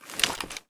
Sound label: aug firemode, weapons; STALKER; IWP